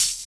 Open Hhat, HiHat; Equipped Music; Loungin House

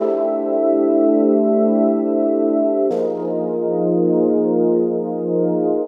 Pad Soul Chords, Drum N Bass; Keys; Sonic Foundry (Sony Creative Software); Knutrix Drum & Bass

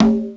LINN2 CONGA; Hollywood Edge; The Big Bad Beatmachine

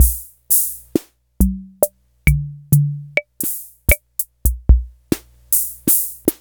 Korg SR-120

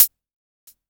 hi hat closed, Korg ER-1; 300 Drum Machines; Kit 15

